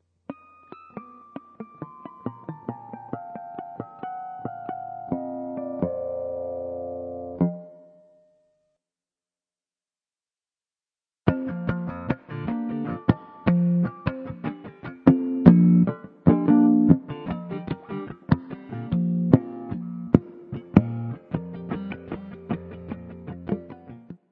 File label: lexicon harmonics; the improvising guitarist